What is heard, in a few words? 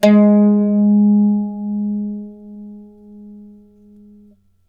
g+2, Mandolin; Guitar; Terkelsen